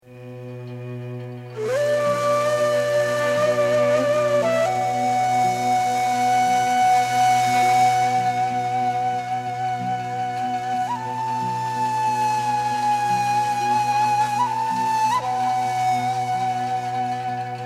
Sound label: ney taksim